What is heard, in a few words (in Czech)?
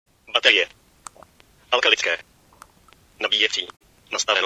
baterie